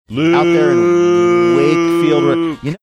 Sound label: chanting luuuuke